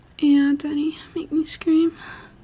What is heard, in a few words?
scream1